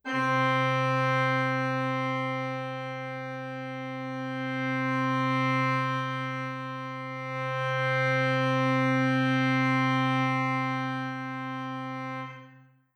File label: Single Note